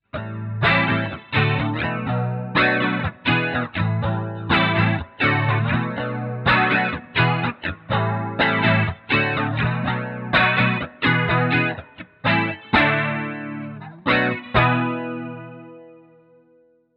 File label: Framus Electric Chorus